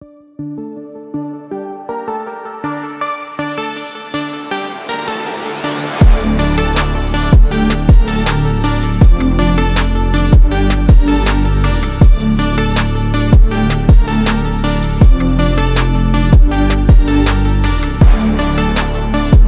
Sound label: Futuristic Hip Hop